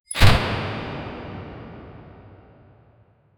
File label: portal lighting lightning